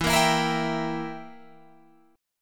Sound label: E Diminished down Guitar Open G